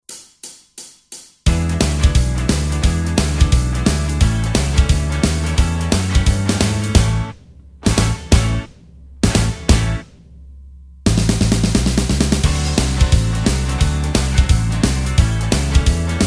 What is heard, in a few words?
A No Lead Guitar, backing tracks